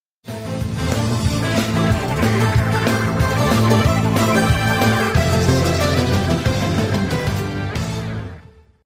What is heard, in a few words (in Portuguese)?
vinheta; jornal hoje